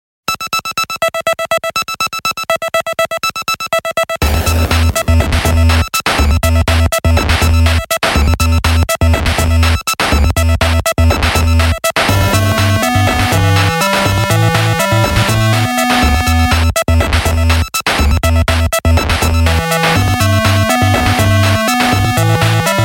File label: Feel your noise 8Bit; meloboom